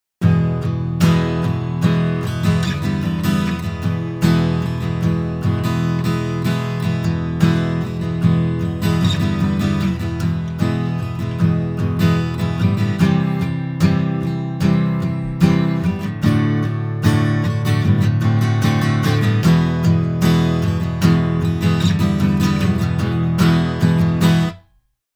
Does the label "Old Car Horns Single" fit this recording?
No